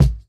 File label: Kick, jungle breaks drum sounds; ORIGINAL JUNGLE BREAKS